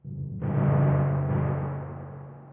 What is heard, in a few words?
Tymp